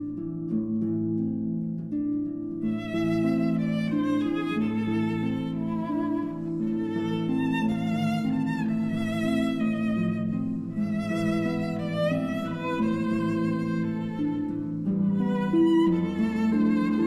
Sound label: Berceuse, for violin and harp